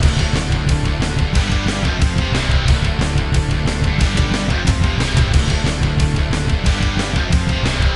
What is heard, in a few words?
sounds music; Halo Combat Evolved; Xbox